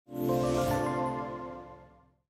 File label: Egg Appear; Flappy Bird Crypto